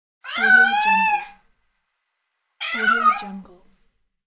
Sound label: cat meow; میو گربهی عصبی; nature sounds